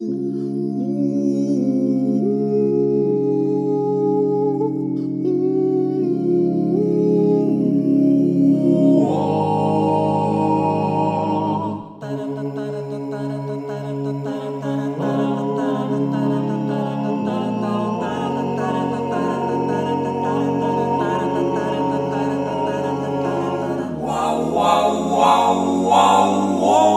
Acapella; Leviathan 1

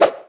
player throw grenade

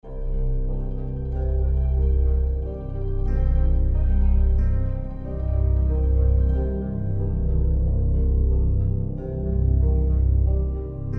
Eerie; the walking dead season one

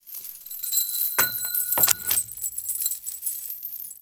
handcuffs

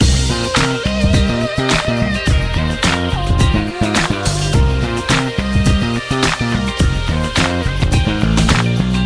Funk; Learn to Fly 2

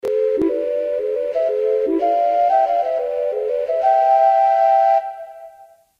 Musical; rpg maker mv

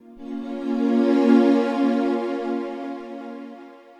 Pad; Dr Watson Pad; Proteus

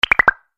Bubble Slide

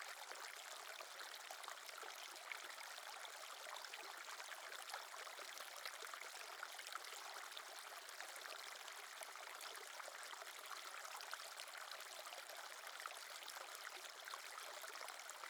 Water Idle

